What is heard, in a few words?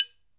TRIANGL; Sonic Reality; Interactive Drum Kits